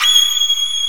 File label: Arco Violin G#6; Multisamples; Proteus 2000; Digital Sound Factory Proteus 2000